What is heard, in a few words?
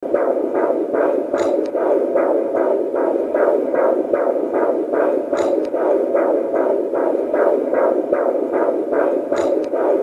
baby heartbeat